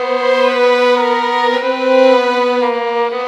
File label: Violins, Cinematic; Sonic Foundry (Sony Creative Software); Orchestral Series VOL-3; Cinematic 17